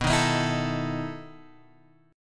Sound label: B 11th B, chord; B11 Chord {7 6 X 8 5 5} strummed